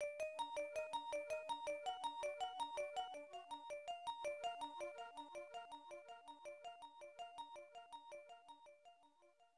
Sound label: Logo; Street Fighter Alpha 2; Zero 2; CP System II; arcade